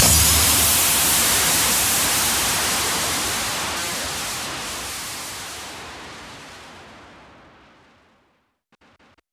Downsweeps

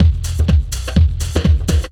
drm 125, drumloops bassworx; PS-28 Vocal House Samples

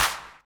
Clap; Vengeance Samples; VEC1 Claps

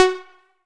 Synth Cuts; Vengeance Samples; VES1 Instruments

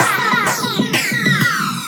128BPM BaBaBa 1Bar, FX Fills; Vengeance Samples

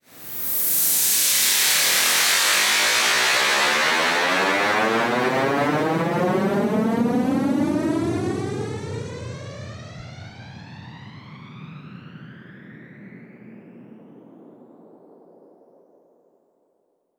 Uplifter

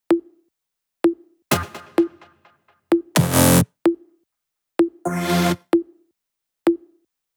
128BPM Melody Root F; Vengeance Samples; Body Kit

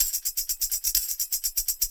mix percussion loops, 125; PS-28 Vocal House Samples

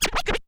Cuts, Vinyl Record Samples

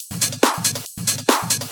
Loop 140 BPM; vengeance essential club sounds-3

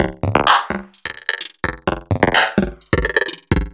VFX2 Loop 128 BPM

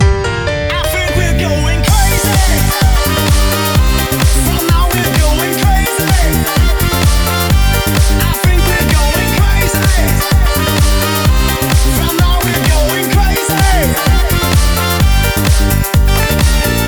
Male Mix, 128 BPM; Vengeance Samples; Vocal-Hook Kits; Song Kit

